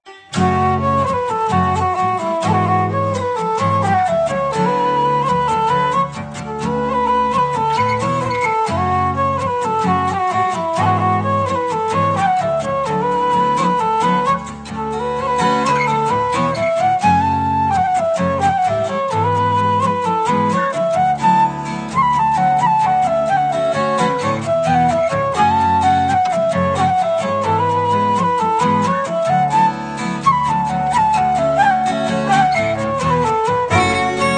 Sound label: Slip Jig, Lent; Farewell to Whalley Range